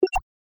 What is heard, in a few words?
send click